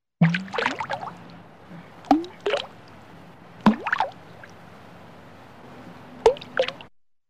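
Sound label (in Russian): галька маленький камень падает в воду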